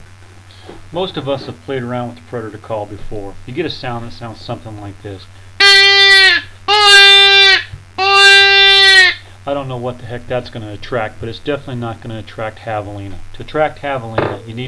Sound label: javelina sound; Javelina calling; Intro to Calling Javelina